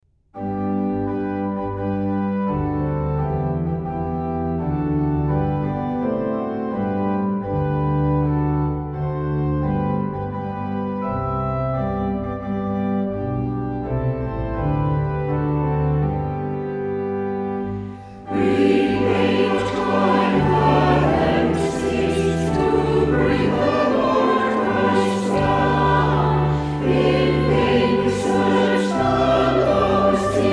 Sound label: hymn